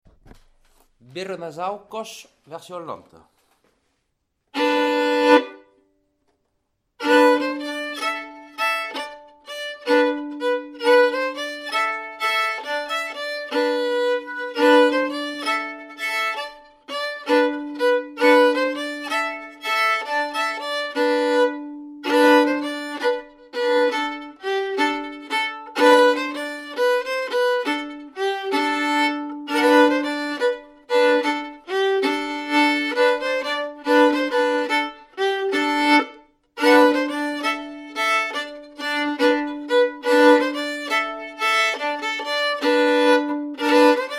ronde vira lent; aucas

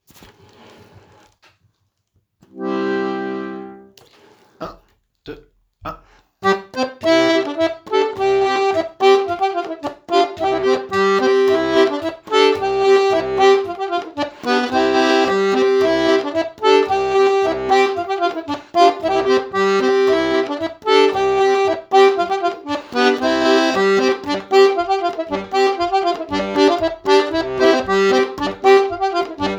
Polka du Lot

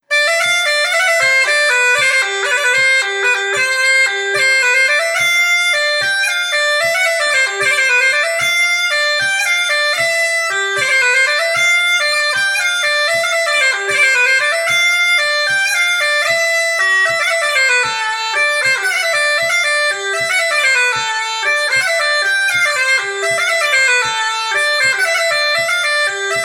bourrée des mariniers

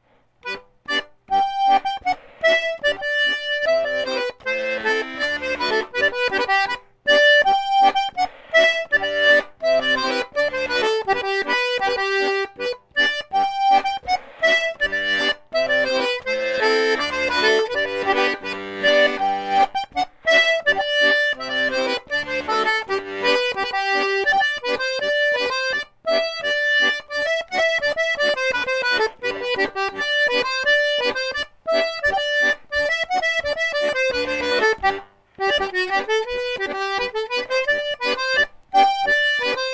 scottish Planard normale

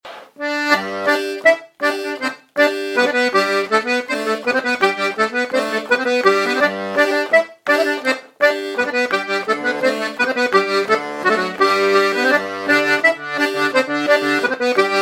Scottish de Roquefort

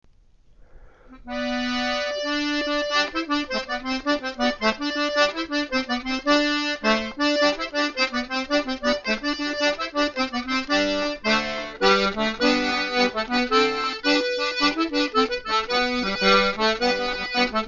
Rondeaux du Savès

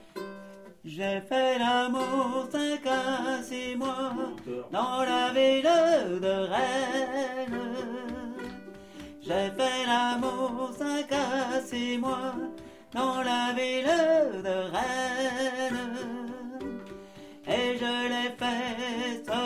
La ville de Rennes (2e voix)

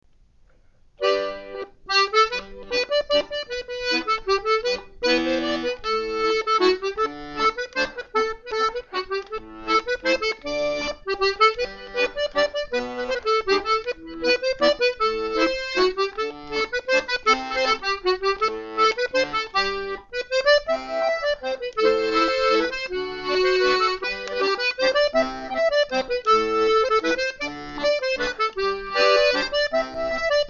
Mazurka de Gabriel Meuns

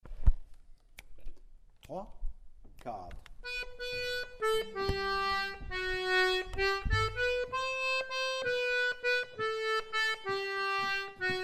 Mon pauvre amant (instrumental)

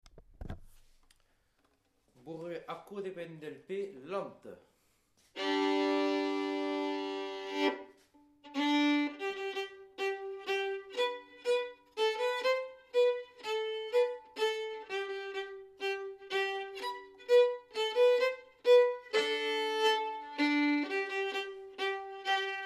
Bourrée version lente

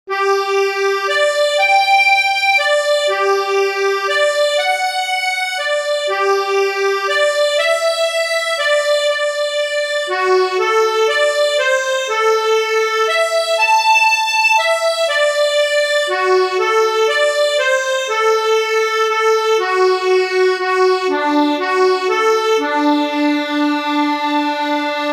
Intro vents

